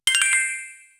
candy explod

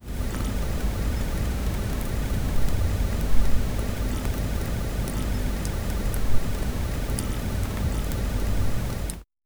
slow motion raindrops fal, water surface

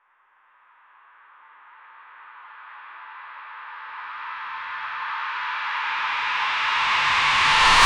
riser for trailer

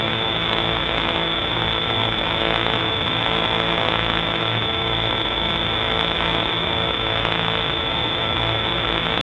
create vintage radio emer, broadcast

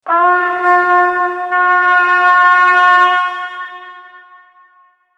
the trumpet call of god, angelic

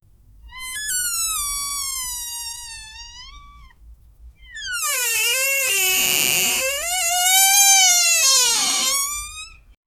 sound effect for a door; creek

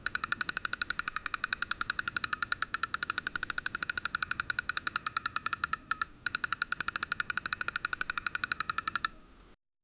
non musical mechanical, music box

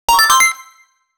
merge 3 mobile game combo

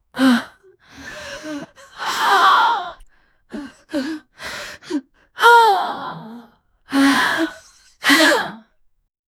aggressive demonic female

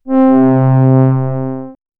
warm analog synthesizer

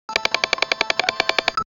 monetary transaction

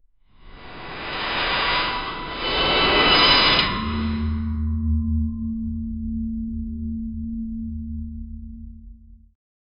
a massive ultra heavy, ground

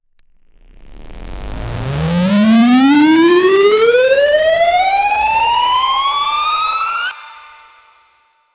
riser, build up

